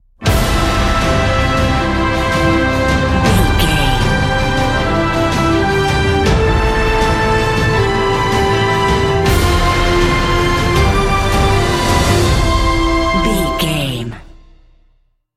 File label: cinematic corporate